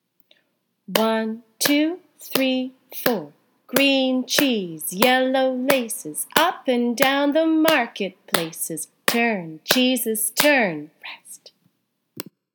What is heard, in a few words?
Green cheese with beat